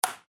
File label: bounce